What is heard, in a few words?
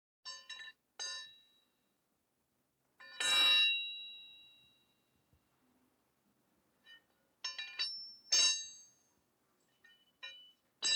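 Crowbar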